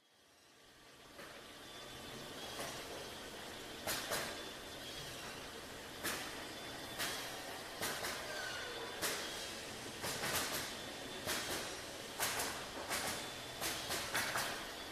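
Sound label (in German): sägewerk; minecraft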